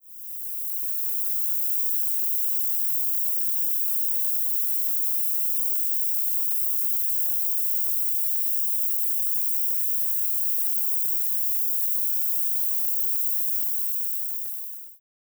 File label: gas leaking; noisepalette; sirenfx